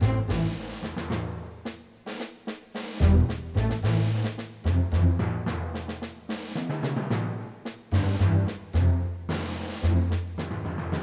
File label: Music Pre Battle Bed